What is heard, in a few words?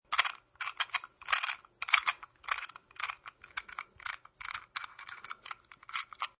Lock